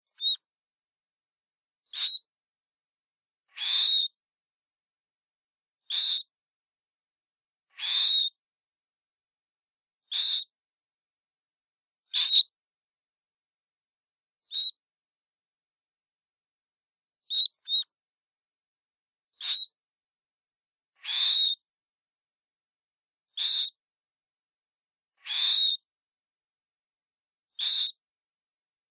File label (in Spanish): Sonido del Erizo, Sonidos cortos de Animales